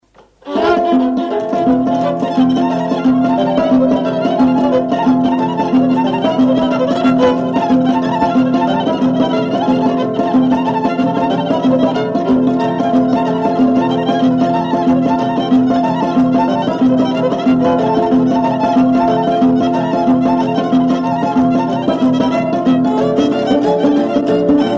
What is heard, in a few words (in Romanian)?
Rusasca taraf; jocuri si cantece batranesti; Vaslui